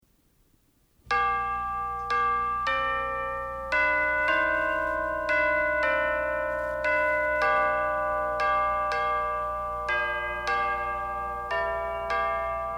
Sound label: Christmas Organ & Chimes - Three Kings